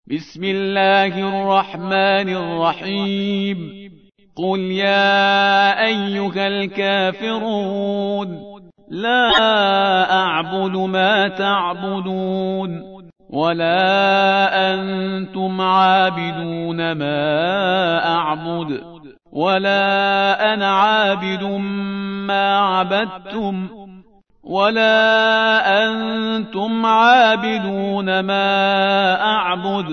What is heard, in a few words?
soore kaferoon; ostad parhizkar